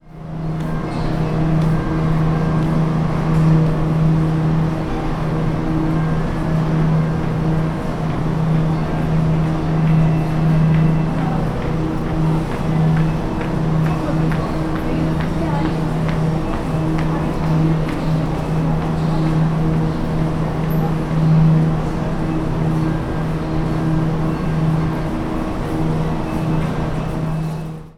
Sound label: drone